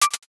cursor blink clean; buttons ui

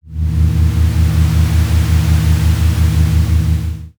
horror dark drone

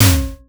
impact hit

